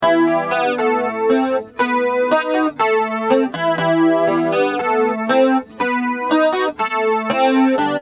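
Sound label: acoustic guitar flanger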